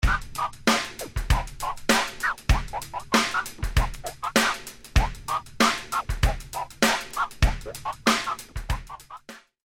Scratching with continuous eighth notes transformer